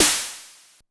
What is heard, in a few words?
999 Snare Long, drum kit; Concrete Collage Drum Kit; Concrete-999